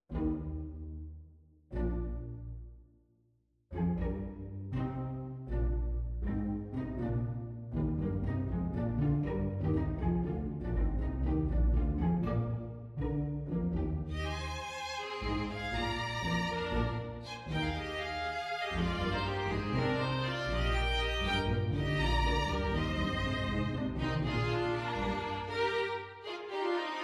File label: serenade for strings